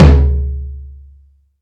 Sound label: TOM